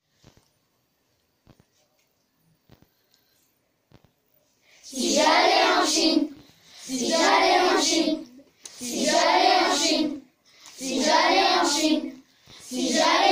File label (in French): Chant; Si j'allais en Chine